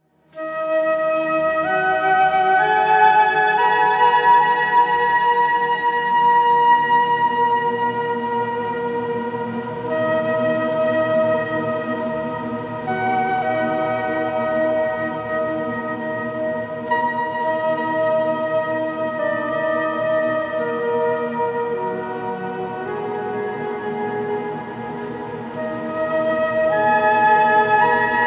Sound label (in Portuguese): Flauta